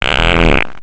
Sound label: fart; A nasty one I ripped last night